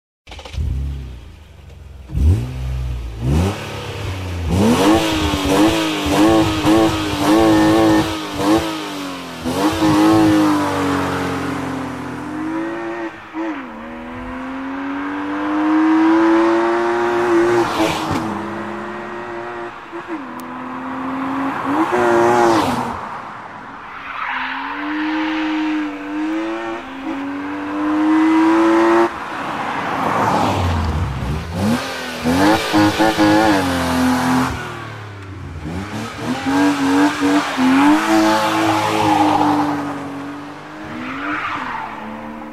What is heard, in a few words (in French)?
Acceleration bmw